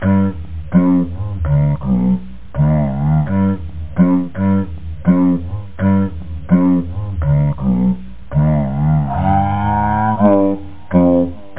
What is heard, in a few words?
dip dap, loop